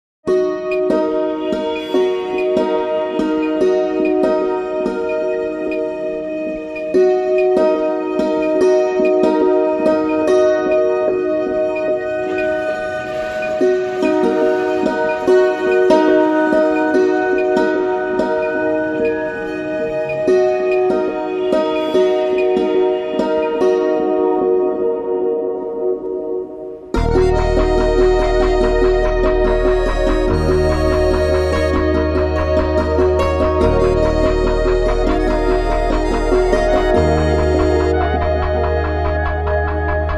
Alpine Ambience

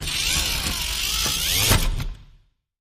Toy Robot Fast Movement

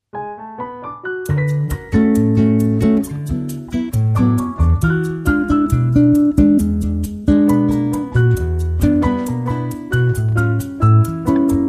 Bossa

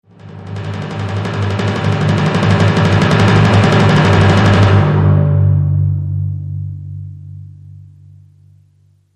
Timpani Roll

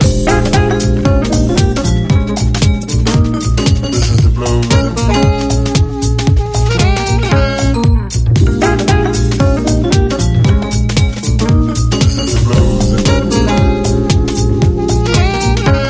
Jazz

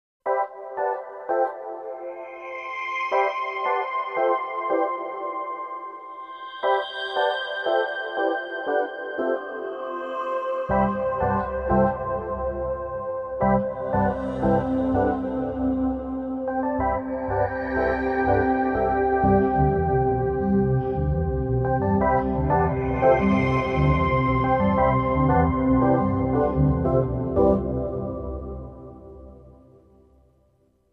No Pulse Playful Fascination

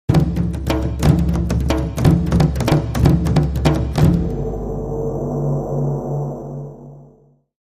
Taiko Thunder

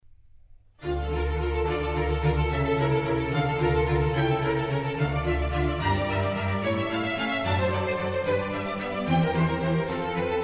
Concerto for Strings in D minor; RV127; Antonio Vivaldi

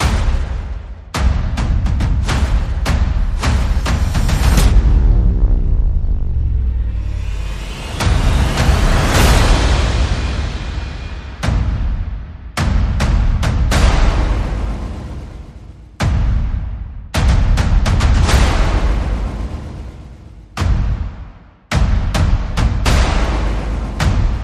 Percussion and Effects

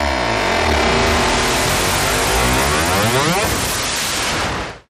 Glitch Creaking

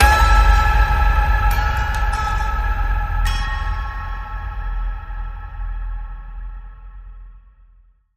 Ping

